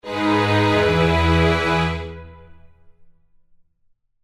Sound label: Orchestra